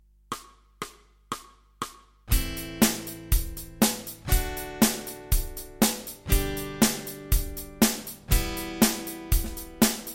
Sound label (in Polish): aDGe zmiana akordow do rytmu